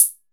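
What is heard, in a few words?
Nord closed hi-hat, techno; Drums In Your FACE!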